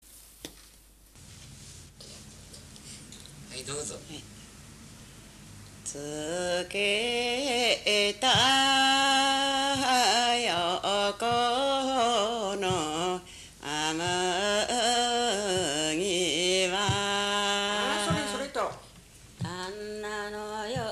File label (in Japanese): mugitukiuta, minyou